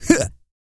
GRUNT, MALE HUMAN; Spectrasonics Vocal Planet

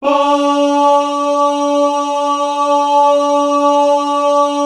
BAH D# 3, Synclavier; ILIO

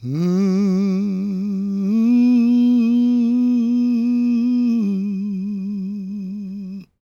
GOSP MALE, SOLO; Gospel

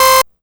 Synth Hit C; Trance; Synth Hits; Zero-G Trance Inducer; 90 s Sample CDs

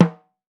NIGERIAN, African Perc; E-MU Formula 4000 Series Vol. 4; Earth Tones

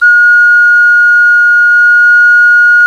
Clay Flute; Roland LCDP11 Africa VOL-1; Afro Pipes

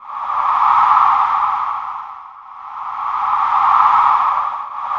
ARCTIC WIND, ATMOS HUM; Spectrasonic Distorted Reality 1

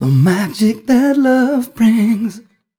m 128 mel b-flat, Vocals

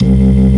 ORG VK, B-3 ORGANS Vinta Keys B3

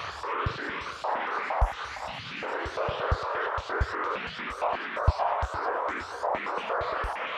Moving Noise 130, Tempo Loops 130bpm

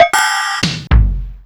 Fairlight IIx

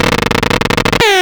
CRACKLIN, Anime Sound FX; COMIC

